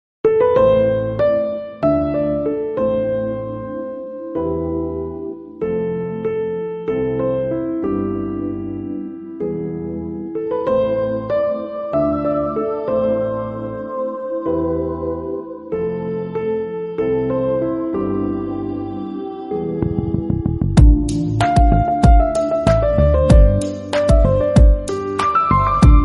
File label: bgm; siru siru uravugal